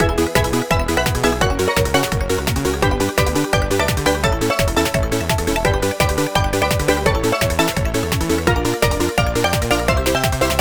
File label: bonustime; Leaguemap; king